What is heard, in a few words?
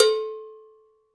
Cowbell Open; Cowbell (Pearl El Negro)